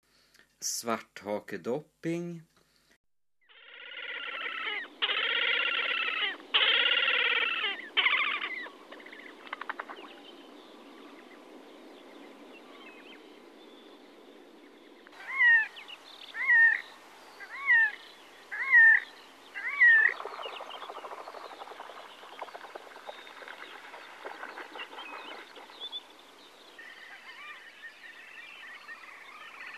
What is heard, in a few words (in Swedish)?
Svarthakedopping